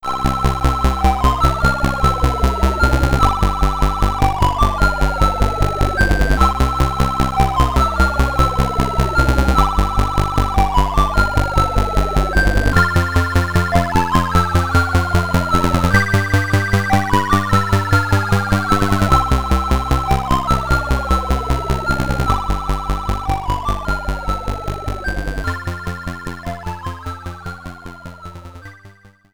Plane Sequence, SID Stereo; Glider Rider; Commodore 64

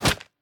Hit Bug; we are the dwarves gamerip 2016

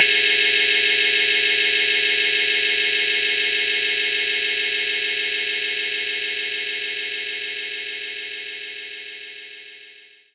Starship Noise

